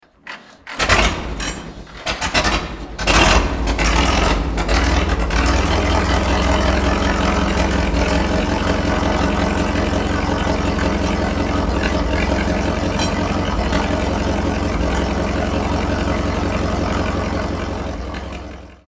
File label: tractor startup stereo